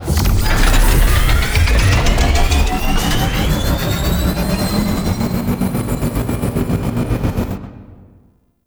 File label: Machine Thrum; Entrati Labs; Cavia Rank Up Scenes